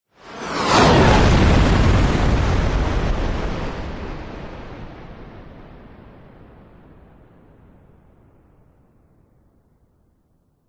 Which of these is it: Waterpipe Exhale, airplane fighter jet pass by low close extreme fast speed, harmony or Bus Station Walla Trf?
airplane fighter jet pass by low close extreme fast speed